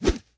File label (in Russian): energichnij rezkij vzmah razrezayushij vozduh